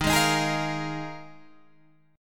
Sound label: D sharp Suspended 2nd Suspended 4th, Guitar, Half Step Down